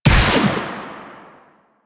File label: Igniter Pistol Shot; Warframe; Weapons; Corpus